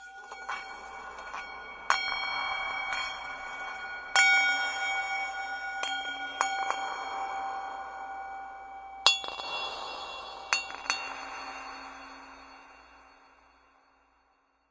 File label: bowls rolling verby; Bells